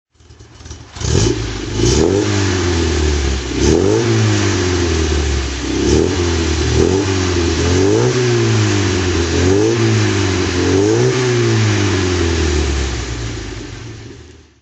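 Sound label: volvo p1800s throttle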